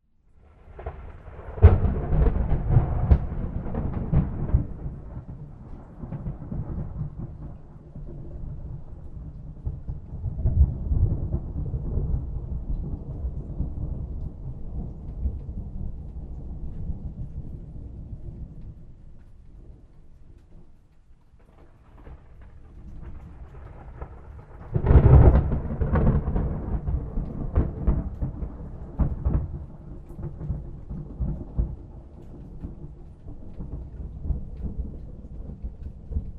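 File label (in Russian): слабый дождичек и сильные удары грома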